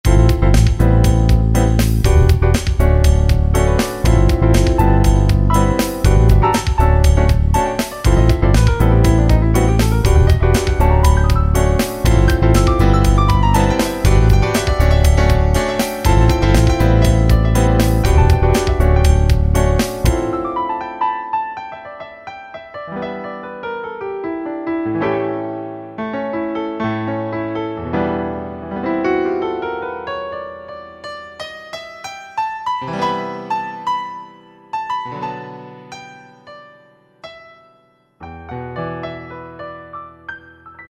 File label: jam Grand Piano, E-mu PK-6